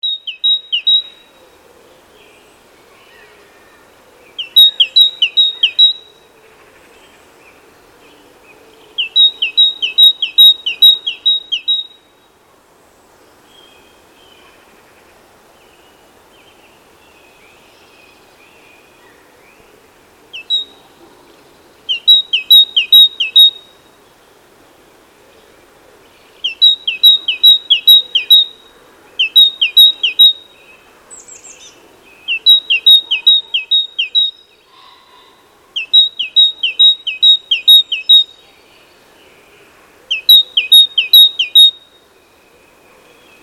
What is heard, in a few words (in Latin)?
Parus major; SETE